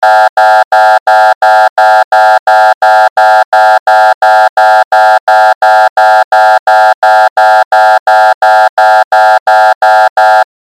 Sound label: sound earthquake maneuver